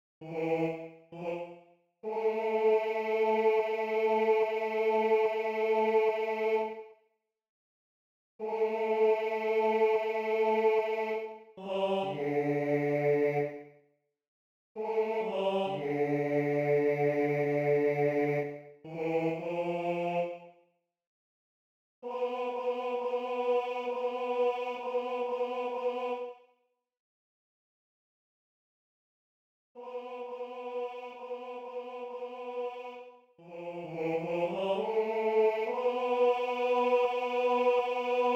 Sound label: tenor